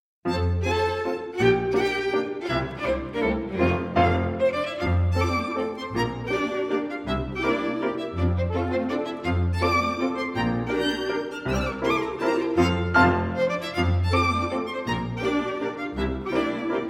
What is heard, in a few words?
Preparation For Grands Battements Polka Mazurka; Musidora